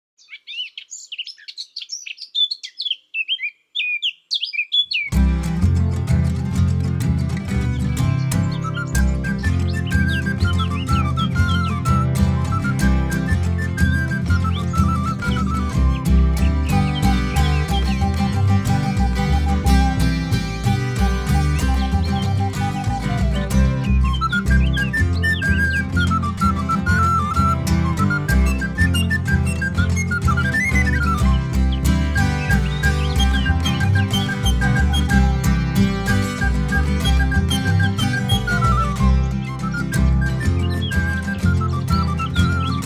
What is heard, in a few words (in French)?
Fauvette pipelette instru; cuicui et compagnie